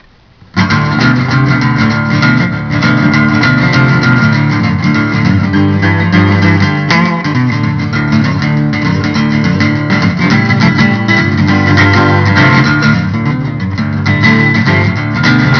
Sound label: blues